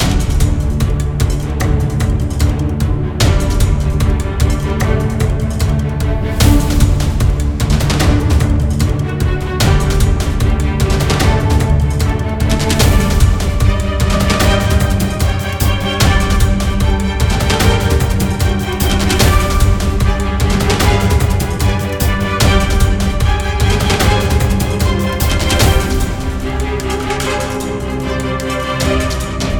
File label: Epic Battle Looped; Dual Blade: Battle of the Female Ninja